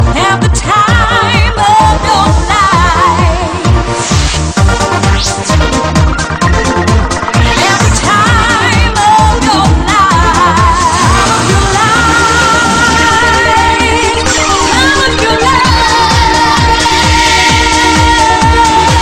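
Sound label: Xylophone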